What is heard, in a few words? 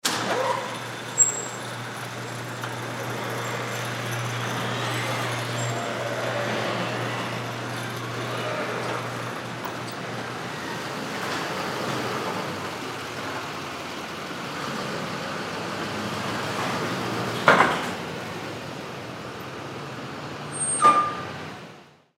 Ambulance Station, Ambulance Station Garage Door Open; Truck Pull In; Emergency